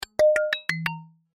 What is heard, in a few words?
Cartoon Mallets Melody Short; Cartoon Music; Cartoons; Blastwave